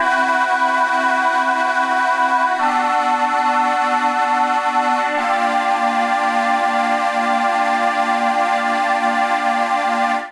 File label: Creamy Voices D Minor 93 bpm; MIDI Clips; Tonal; Accompaniment; Ableton; Factory Packs; Chop and Swing